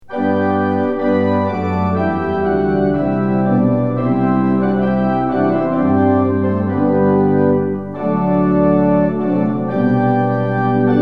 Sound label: tune; hymns; lillenas